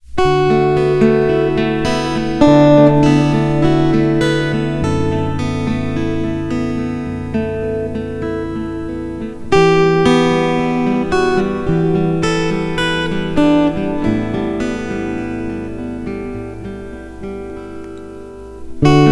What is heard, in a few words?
Walk Guitar; 밝고 맑은